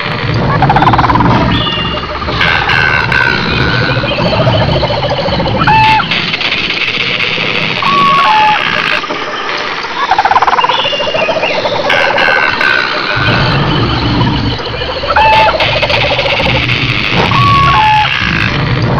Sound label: loop